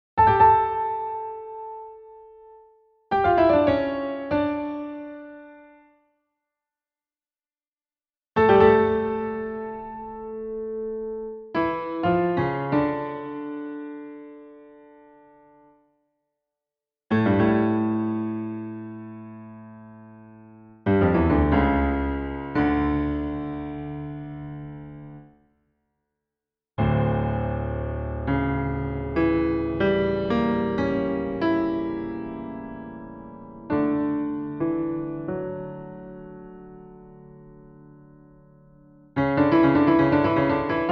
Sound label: Toccata and Fugue for Organ in D Minor; Bach J.S.; BWV 565